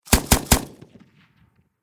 Warfare Machine Gun Pkm Russian Triple Shot Close Perspective